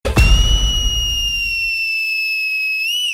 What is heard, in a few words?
Wistle